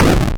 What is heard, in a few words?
rock destroyed; fysxasteroids